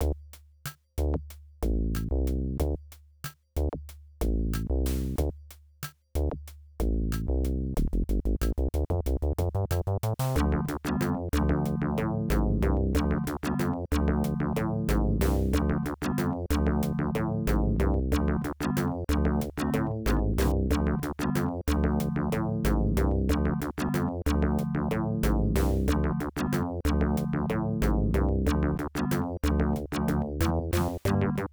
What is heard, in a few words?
AdLib; Last Laugh; Blake Stone Planet Strike; MS-DOS